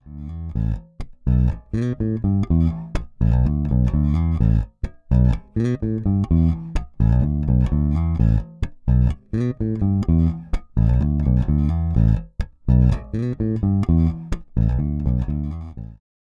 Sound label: Precision Bass Both Pickups aktiv; Fender Troy Sanders